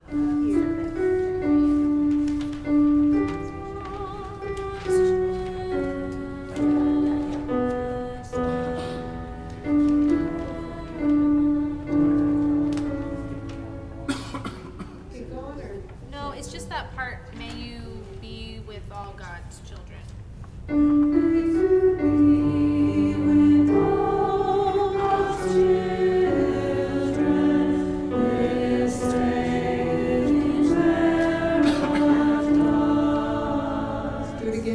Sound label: alto